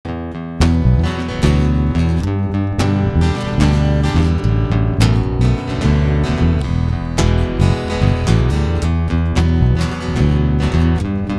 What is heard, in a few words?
get dirty with bass